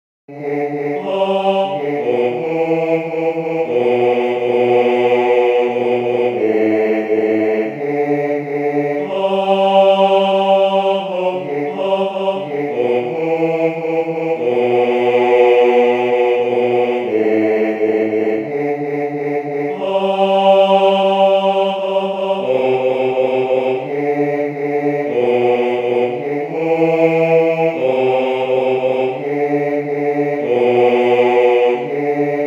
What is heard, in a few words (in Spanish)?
bajo; Cantata para SATB, Solistas y Narración